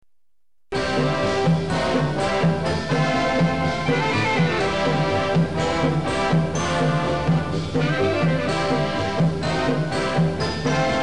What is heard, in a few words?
marching band hail; redskins